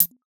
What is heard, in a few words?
HiHat, Psytrance; Drum Shots